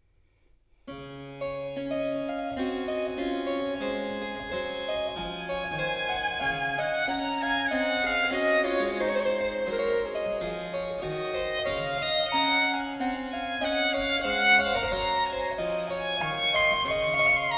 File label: Sonata No. 1 in B Minor, Andante, Violin and Harpsichord; BWV 1014; Bach; J.S. Bach